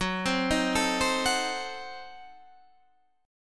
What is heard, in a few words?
F sharp Diminished 7th F sharp Guitar Standard; arpeggiated; JGuitar